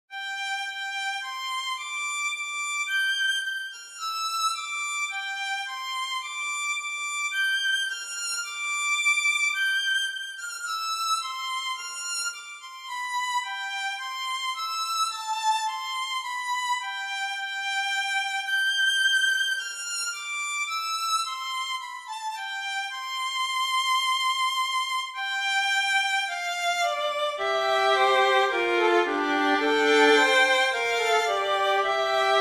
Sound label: violin solo; oh no John